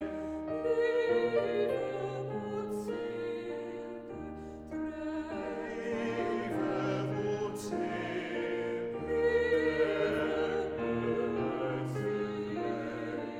Koor gemengd; Amsterdamse studentenekklesia

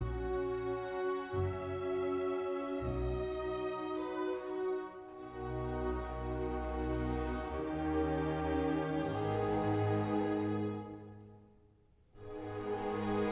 Pianoconcert; No 5 e fl maj Op 73 Emperor

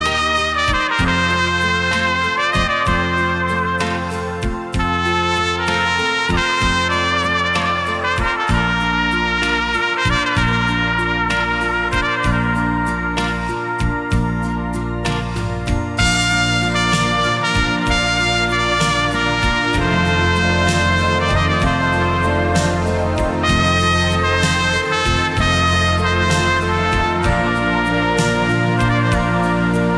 trompet

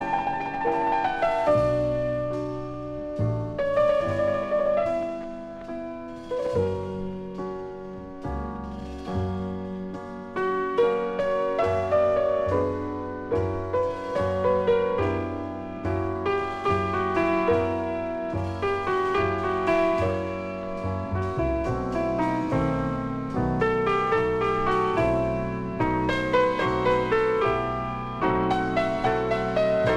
instrumentaal

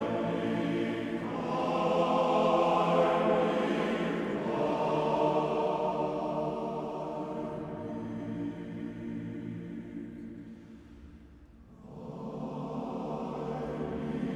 Koor mannen; Mastreechter Staar

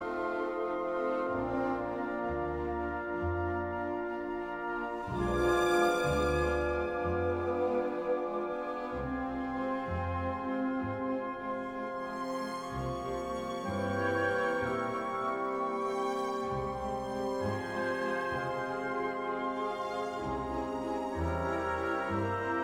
Pianoconcert andante; Mozart; K 467

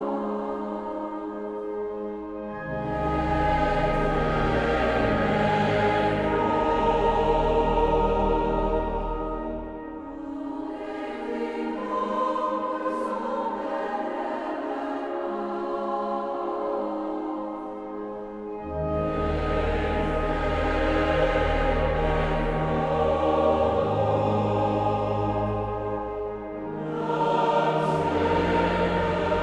Koor gemengd

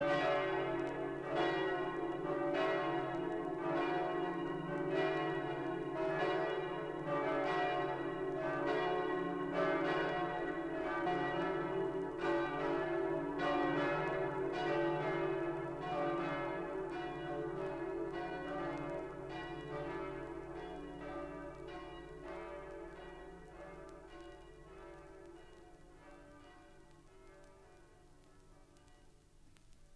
Kerkklokken

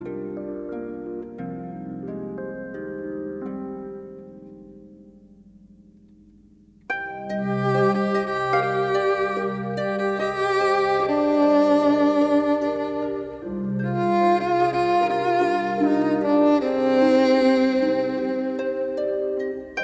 instrumentaal

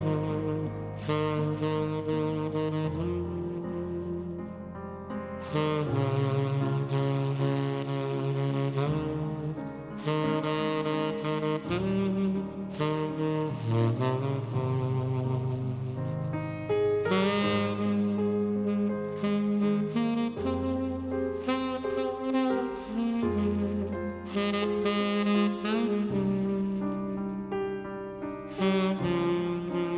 Saxofoon

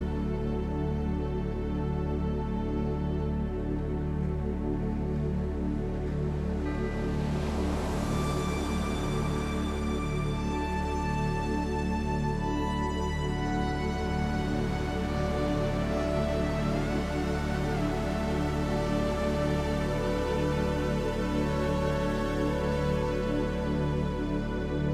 Canon in D ( met geluid van golven ); Pachelbel

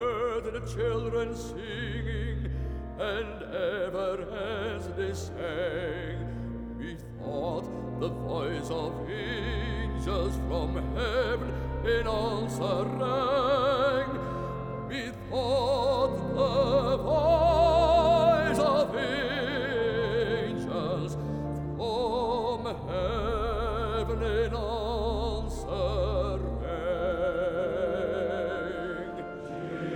Koor (mannen); Mastreechter Staar

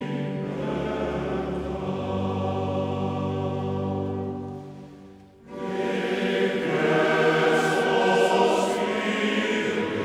Koor mannen; Mastreechter Staar

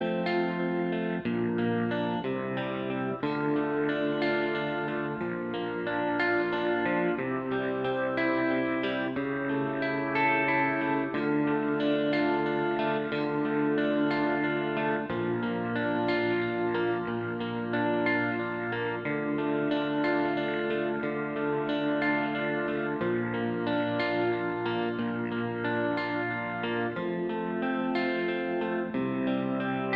Halleluja instrumentaal

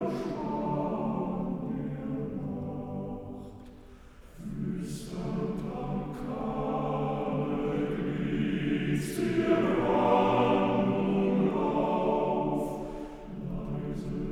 Koor mannen

